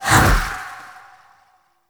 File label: Heavy Landing; Warframe; Wisp